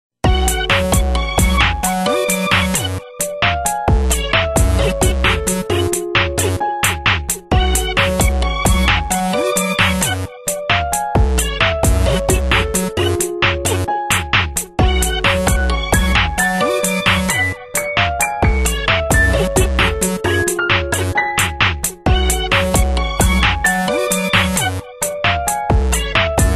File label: Melody, RingTune